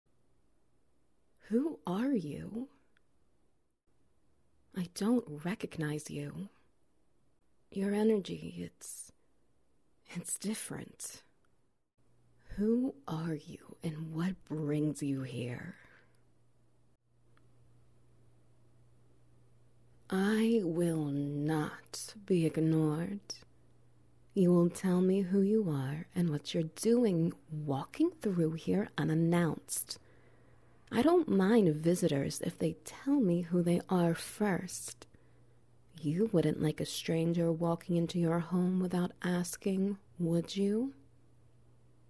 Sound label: Wet Noises